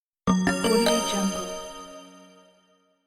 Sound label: message notify